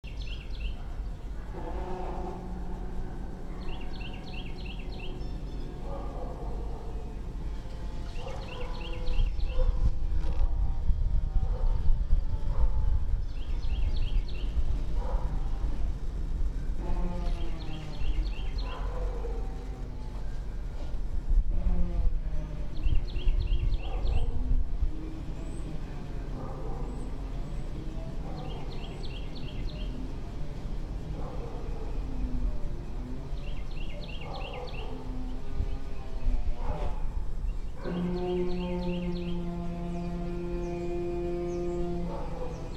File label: Drilling with Birds Petersburg VA